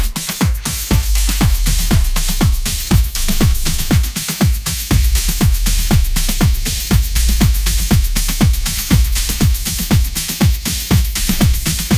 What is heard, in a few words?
sunlit phonk groove